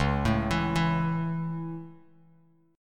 Db Minor Sharp 5th Db Bass Standard; arpeggiated; Dbm#5; JGuitar

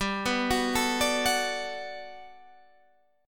G Major 9th, Guitar, Half Step Down

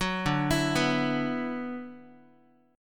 D 6th Add 9th D, Guitar; D6add9 arpeggiated; JGuitar

